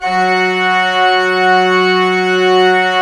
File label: ORG, CHURCH ORGAN; Roland - LA Composer vol. 1